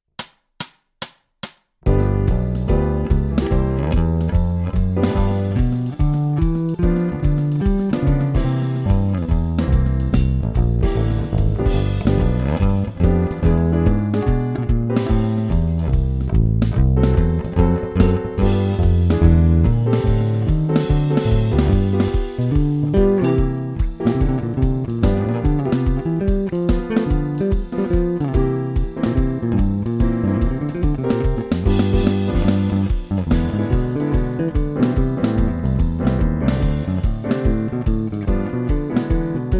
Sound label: Groove & Solo G Mixolydian, guitar